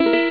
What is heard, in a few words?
s guitar; Amiga Soundtracker sample